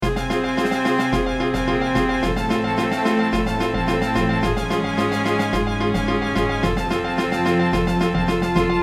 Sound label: Orchestra